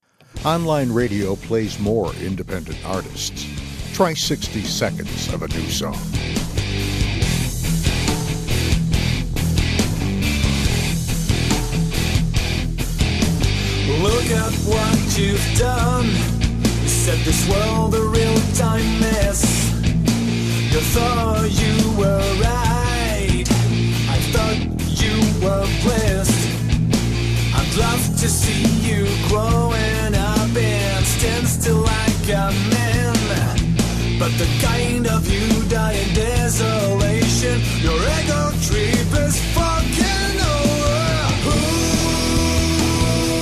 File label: hard rock